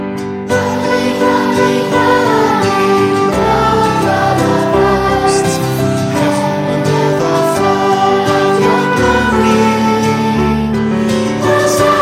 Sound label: Holy Holy Vocal